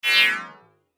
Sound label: App Game Spell, magic; Magic Sound Effects